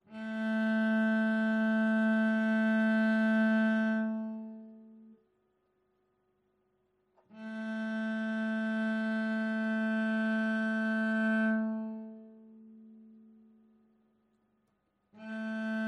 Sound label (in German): stimmtoene